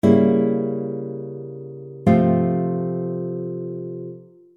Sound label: Diminished Dominant Scale